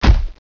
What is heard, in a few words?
run step, footsteps; vinagre npcpack